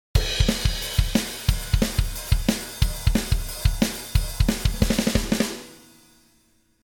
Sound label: Drum Glue Comp